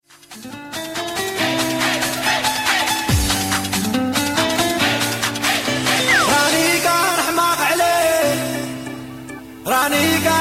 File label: arabic mix dance